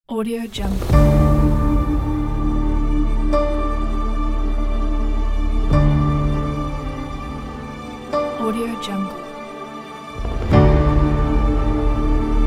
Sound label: rock and funk trailer; magicmusicstudio